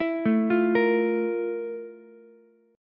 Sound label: F Major 11th Ukulele C6; arpeggiated; JGuitar